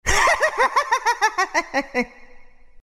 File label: laugh, zh; dota2